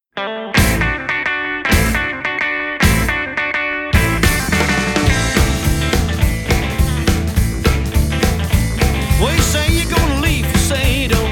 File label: Whistle Into The Wind